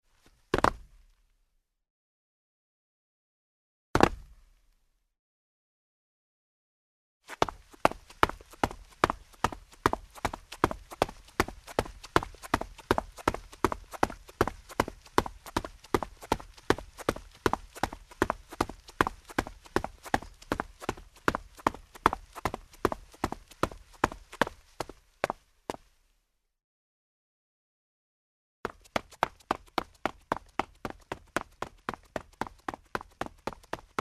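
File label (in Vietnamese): Âm thanh bước chân trên nền đất cứng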